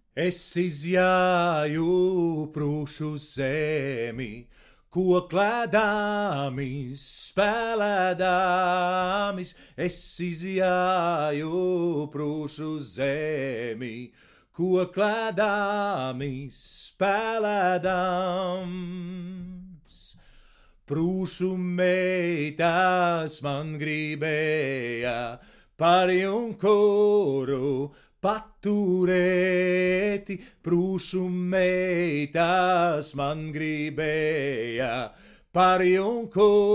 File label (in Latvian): vokals; Nakts; Es izjaju Prusu zemo; li23 nakts posms